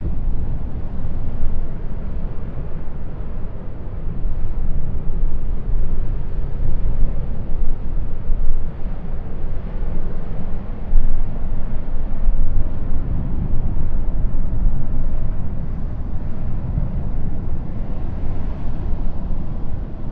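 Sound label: ambience sea; midnight guns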